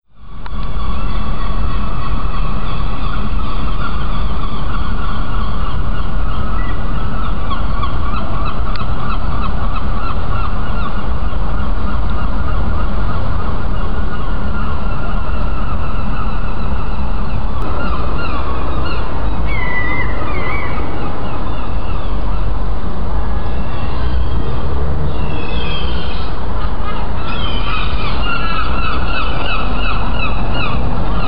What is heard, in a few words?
seagulls, aberdeen